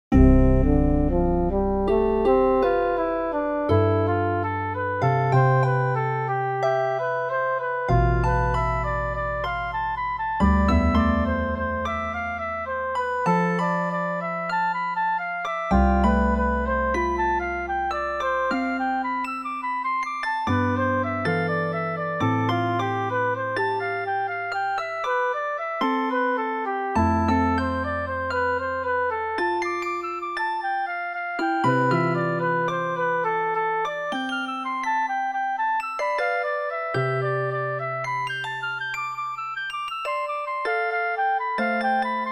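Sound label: Pythagorean diatonic tune; fractal music; Transforming seed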